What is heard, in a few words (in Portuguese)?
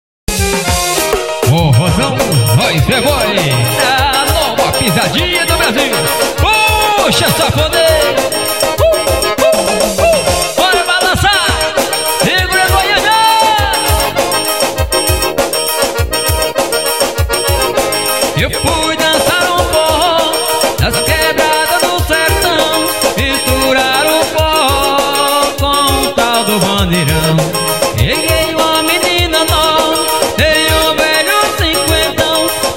forro